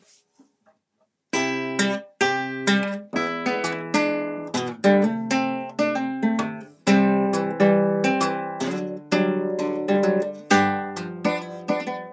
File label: ragtime